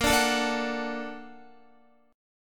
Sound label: Bb Augmented Major 7th Bb, Guitar; Bb+M7 strummed; JGuitar